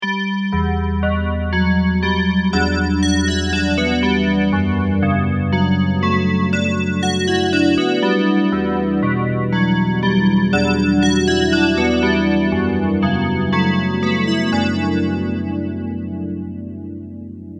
dx7 bells dimension c

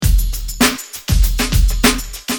drums dry

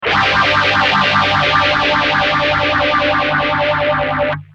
gtr

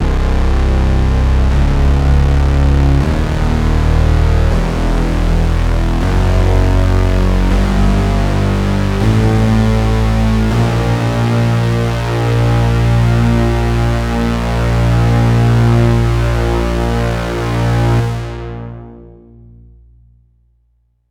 octa scale

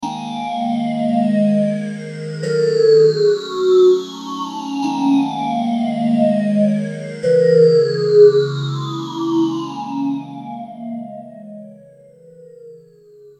m1000 boss ph3

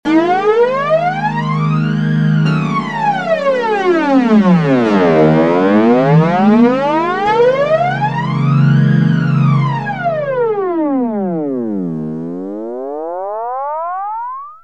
m1000 boss bf1

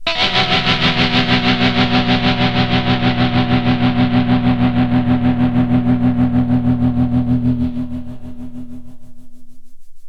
dirty gtr devtronix

